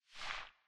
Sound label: menu close